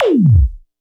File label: kit, Yamaha Motif-ES8